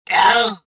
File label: choke